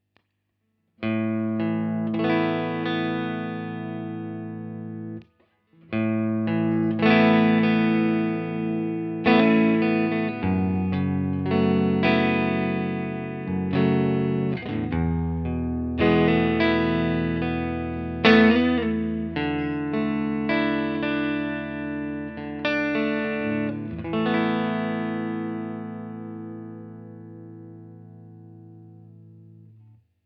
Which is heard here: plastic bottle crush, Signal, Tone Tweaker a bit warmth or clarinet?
Tone Tweaker a bit warmth